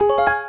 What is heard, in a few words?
success